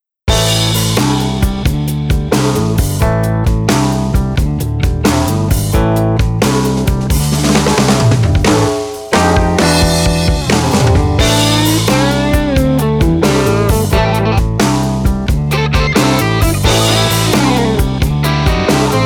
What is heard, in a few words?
Blues